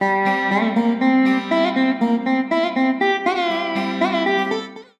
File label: veena